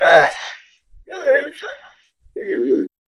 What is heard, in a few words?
jingle